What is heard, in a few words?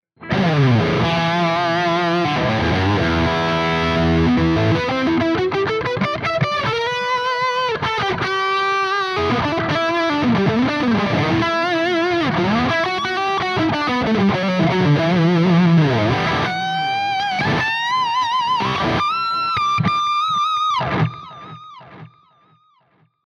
Lead